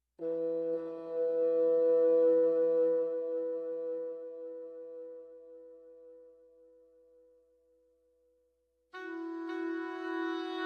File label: Woodwinds